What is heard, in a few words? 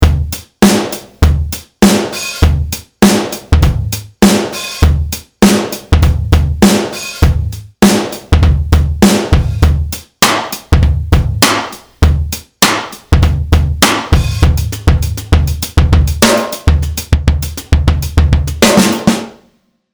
fat big bright room